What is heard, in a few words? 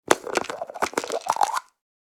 Plastic pill bottle twist cap opening